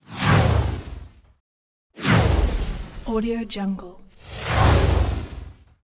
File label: whooshes; transitions and movement